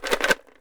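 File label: ELM RATTLE, PERC; AKAI S6000 CD-ROM - WorldSounds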